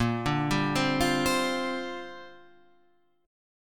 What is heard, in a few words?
A sharp 6th Add 9th; A#6add9 arpeggiated